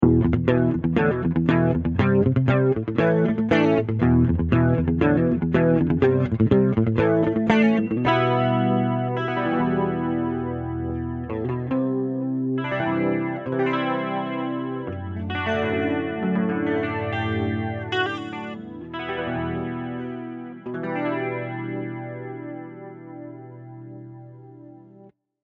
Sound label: Light Flange